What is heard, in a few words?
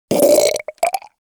creature goblin wet burp